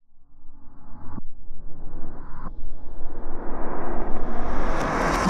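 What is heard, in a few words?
reverse, Cinematic Metal Impacts; Reflex Guider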